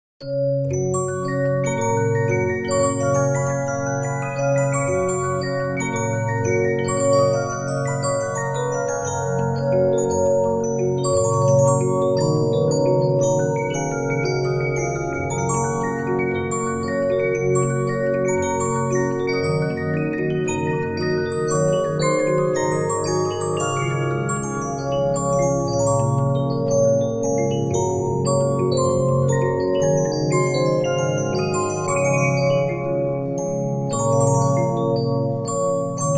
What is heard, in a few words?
Regina, 15inch disc musicbox